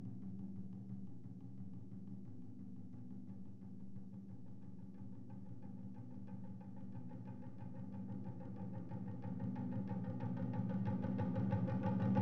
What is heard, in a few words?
Kodo Drum Crescendo; Hits & Tones; Sony Pictures Sound Effects Series Volumes 1-10; Sony Creative Software